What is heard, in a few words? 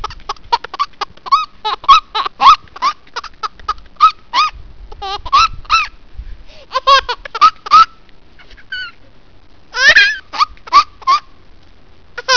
laugh